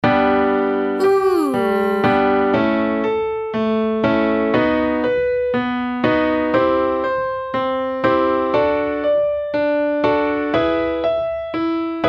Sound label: 8th Descent Slide Oo